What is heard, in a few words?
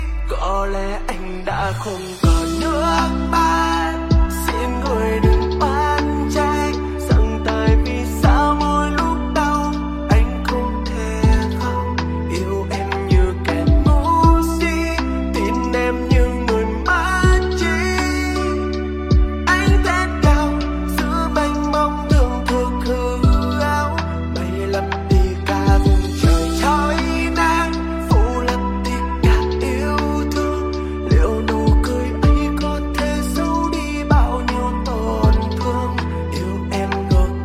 lofi